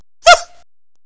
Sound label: yip